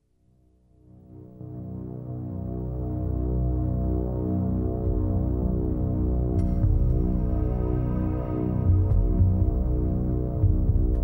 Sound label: Sketch Pad With Trumpet and Voice